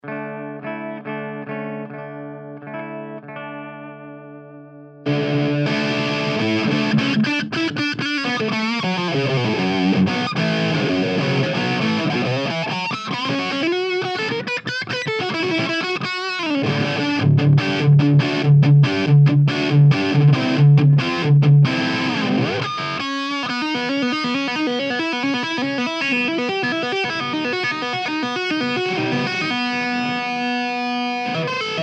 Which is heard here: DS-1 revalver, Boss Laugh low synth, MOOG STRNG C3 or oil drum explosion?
DS-1 revalver